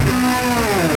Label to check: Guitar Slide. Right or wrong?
Right